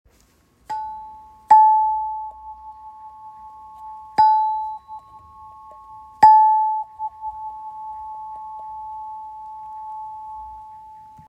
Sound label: Wahwah; 3eme oeil